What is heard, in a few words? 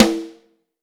Vintage Snare Drum; Q Up Arts; Johnny.C's Vintage Snare Drum